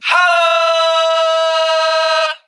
"ho", voice

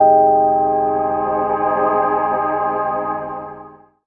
vibe to voice

